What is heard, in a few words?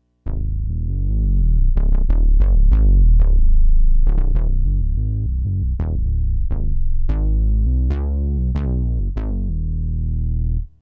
Wolf Bass; Kris Rhen Presets